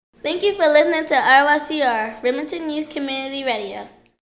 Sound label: Theme Song; Demo Version